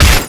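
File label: metal gloves hit robot; melee impacts; Garry's Mod; tf2; mvm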